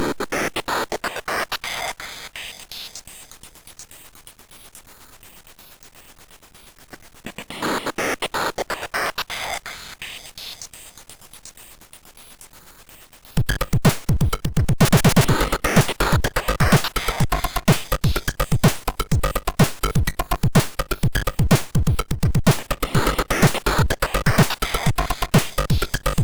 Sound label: Drums, MOS6581R2